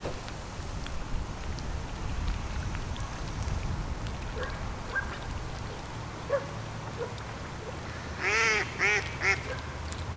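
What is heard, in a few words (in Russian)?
selezen podat solnyy golos, kryakva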